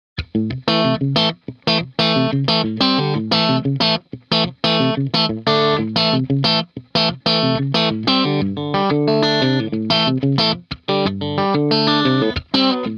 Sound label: Scorpion Clean; mojave